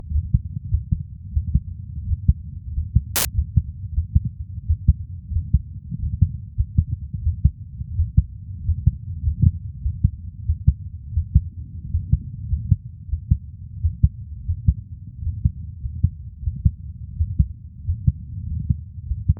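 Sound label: normal cardiac rhythm, heartsound; Arrythmia; Heartsound Library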